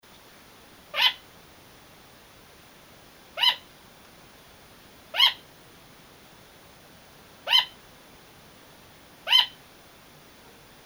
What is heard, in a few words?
Gliders Barking